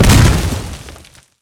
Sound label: pirate cannon barrage boom; LoL; gangplank